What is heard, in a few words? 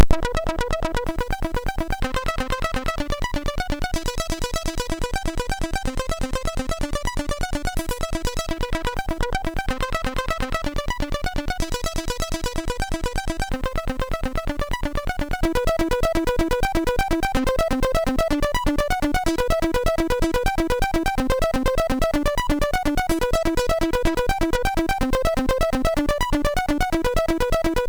Raindrops, MOS6581R3